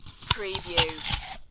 Eating, Bite, Munch, Crunch, Person Bites Into Apple